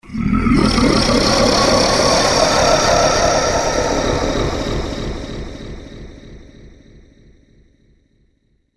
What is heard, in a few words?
Spirit Monster, ghost sound effect; Spirit Monster Of The Dead Planet